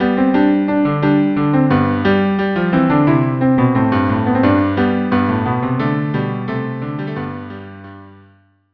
bouree cello duo

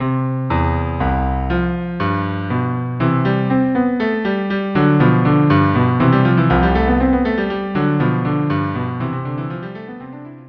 cello duo minuet

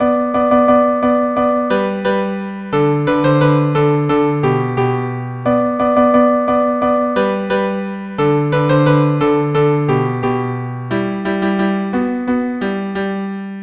viola cello duo; Rossini; 5 duos no 5

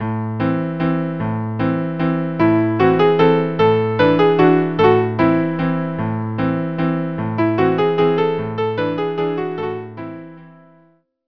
Waltz trio; Grieg

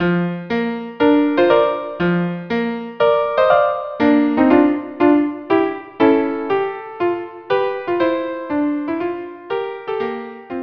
March viola duo; Fidelio

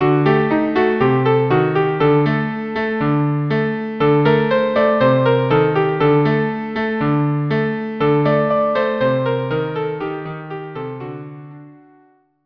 violin cello, duo; Nigun Atik

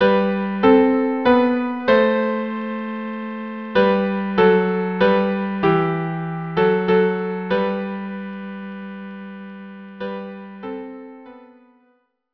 violin viola; Finlandia

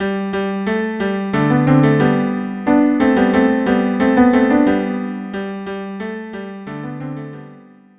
cello duo; Corette; Cotillon

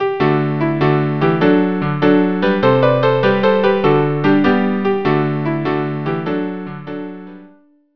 trio

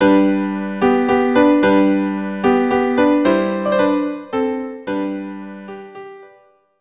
waltz violin duo; Brahms

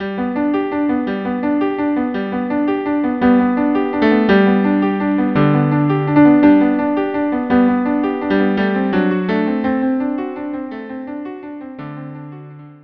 violin cello duo; Va Pensiero

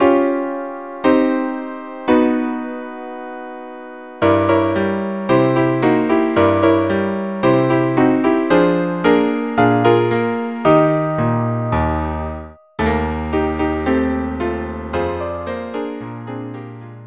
Blues

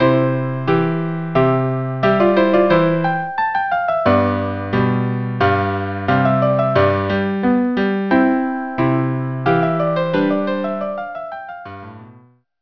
Andante

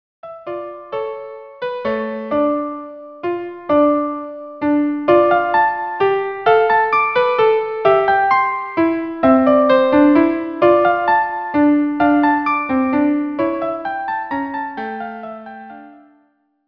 Greensleeves 2 violins

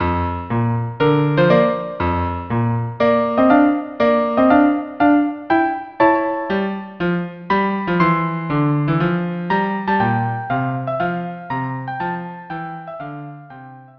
March violin cello; Fidelio

